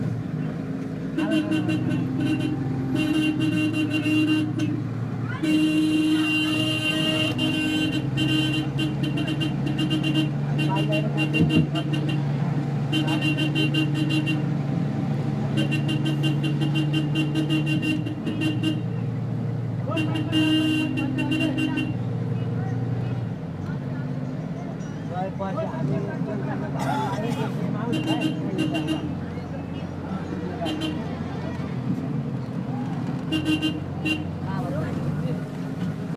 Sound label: Kathmandu Bus Station